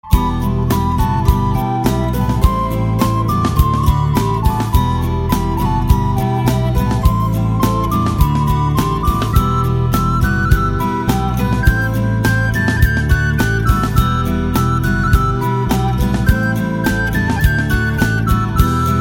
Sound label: Slavic folk